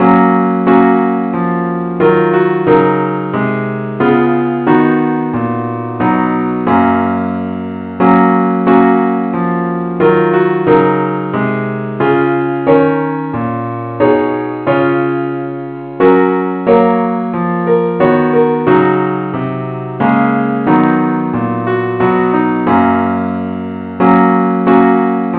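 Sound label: parts only key F sharp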